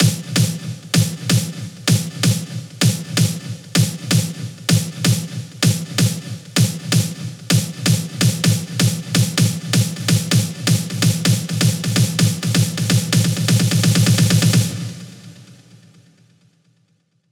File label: 128BPM Cascading, House Electro Fills; 8Bars; Vengeance Samples